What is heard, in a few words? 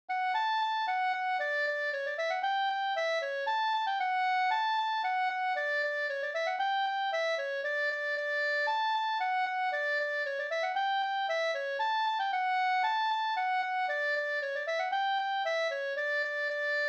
polka; e Hjalmar Bjorklund Oxelosund u Arne Jansson